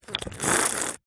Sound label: human drink tea coffee sip slurp